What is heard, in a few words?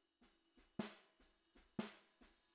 SNR Bot